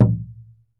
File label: PUEBLO, American Natives; E-MU Formula 4000 Series Vol. 4; Earth Tones